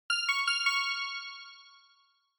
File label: Quiz Ding Dong Slow Short